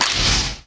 item welder pickup

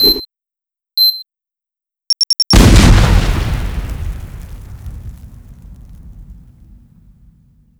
mirv throw full; tf2

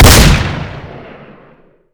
blunderbuss shoot; tf2

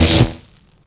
lightning gun zap; tf2

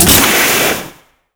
afterburner airblast; tf2

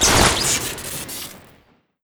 grenade throw crit; tf2

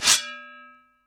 lead pipe recharge; tf2

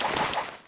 icicle deploy; tf2

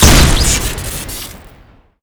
revolver rifle shoot crit; tf2

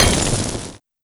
welder build; tf2

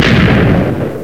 shark explode; sharks; tf2; explodeintosharks

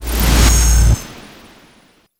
spy tactical uncloak; tf2

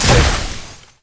booster shot fire, weapons; tf2

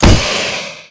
acidlauncher shoot; tf2

